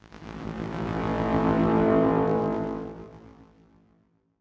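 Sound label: oracle ambience; assassin s creed chronicles india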